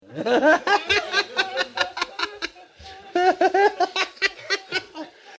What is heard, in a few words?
laughing ringtone; lama yeshe